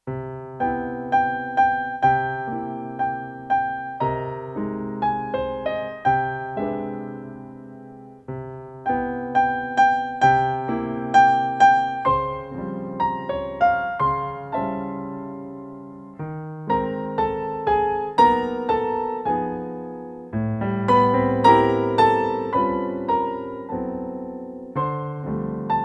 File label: piano